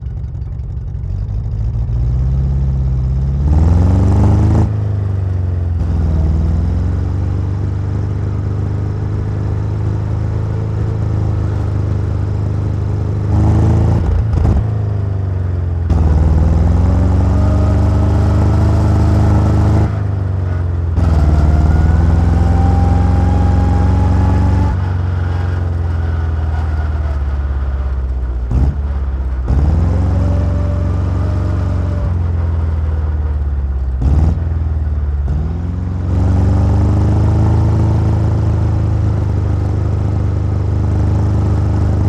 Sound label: Infanterikanonvagn 103 - ONBRD - GEARSHIFTS - Drive Stop - EXHAUST; Assault Gun IKV 103; Pole Position Production